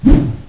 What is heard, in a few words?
katana swing